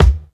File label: rich mids kick sound b key, bass drum tuned to the B note. Loudest frequency: 342